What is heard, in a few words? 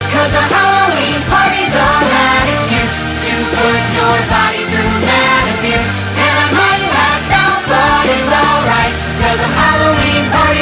Halloween Chorus